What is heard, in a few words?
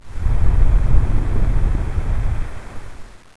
magma; Notrium